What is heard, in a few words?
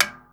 p paila lo; 300 Drum Machines; Yamaha Motif ES-Series